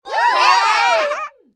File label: Animal Appearance